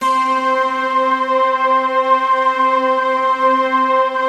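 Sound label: harpsichord, slow harpsiflute; Optical Media International; Sonic Images Library; Harp Synth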